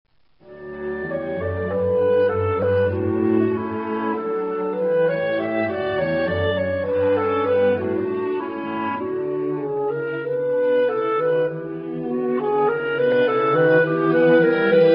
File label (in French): clarinette; brahms